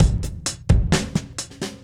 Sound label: Beat Style A 130, breaks; 130bpm